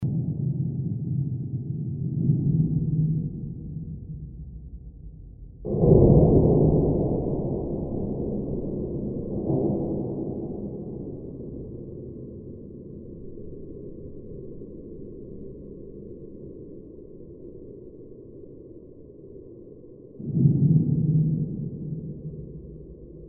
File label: distant piano